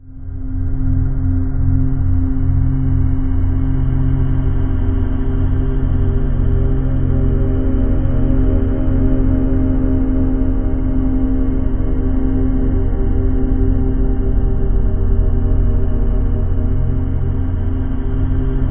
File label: TIBET, LOW TONES, Ominous Drones; USB Soundscan vol.48; AKAI